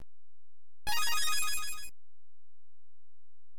Receive Prize; MMBN

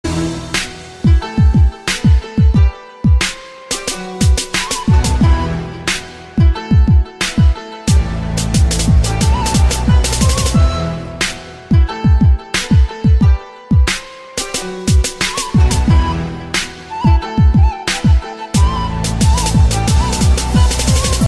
Oriental

